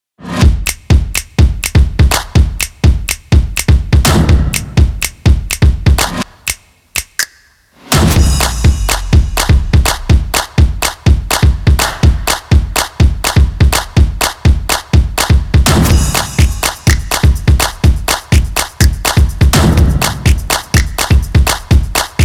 Energetic Upbeat Percussive Action Stomps and Claps No Shouts